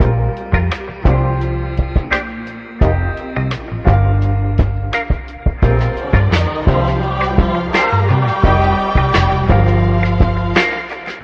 full guitar and choir, Loops, Phonky

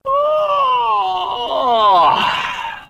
"woah" yawn; ooh ahh

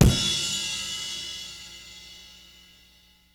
Cymbals

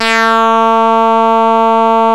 frog

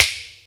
snip, Clap n Snap; Snares

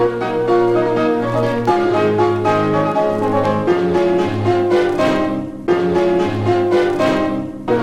horns off kilter

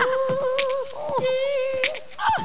eet togetha, Beatbox; Drum loops

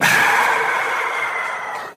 ah exhale; ooh ahh

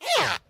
fart squeaky short

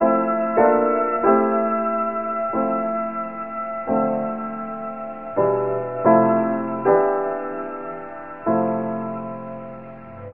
piano, Loops Sad

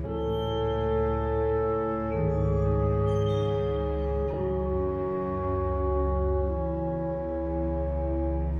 strings; Sad

